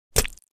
Knife or dagger slide and stab

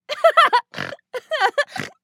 Funny woman laugh

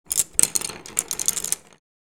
Paint brushes handling and movements

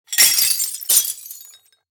Multiple glass shards drop with debris

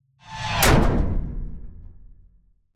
Designed transitional metal impact

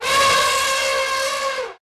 Elephant trumpet

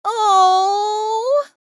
Cute female reaction

